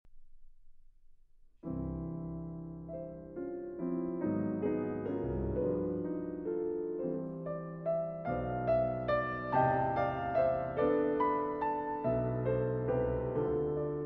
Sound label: Piano Sonata in A major, Tempo di valzer lentissimo; Op.82 - III.